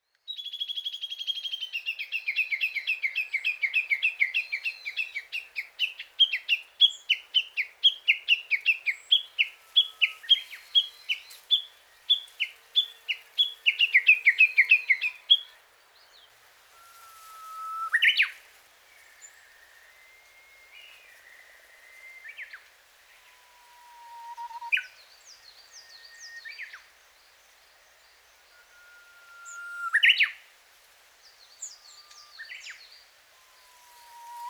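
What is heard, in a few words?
uguisu, Japanese bush warbler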